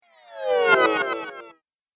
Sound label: Cartoon Game Spell, Magic; Imagination